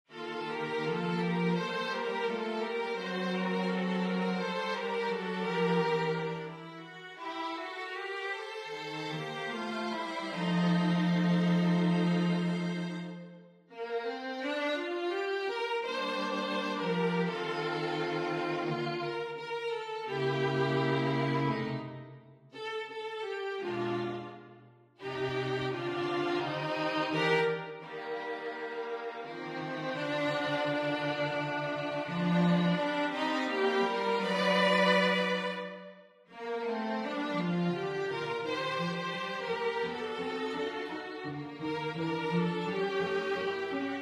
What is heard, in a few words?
strings